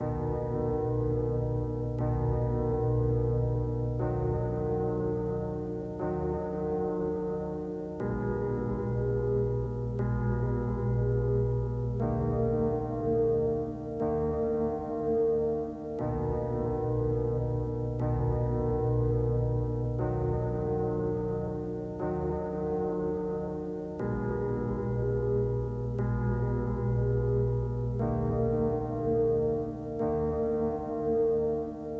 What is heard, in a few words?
ambient meditation